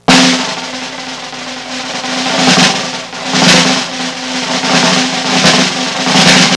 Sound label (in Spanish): redoble; Pipo-Aprende musica con Pipo